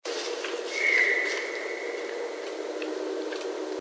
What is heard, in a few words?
Whistler; bigfoot